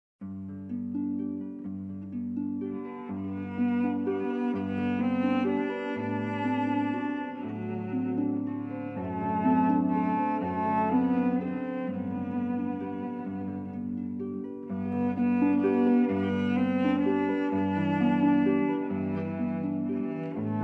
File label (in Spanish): arpa y cello